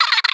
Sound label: Bug